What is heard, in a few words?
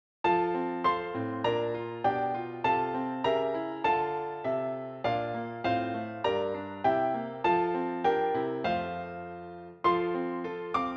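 piano four hands; Alle Jahre wieder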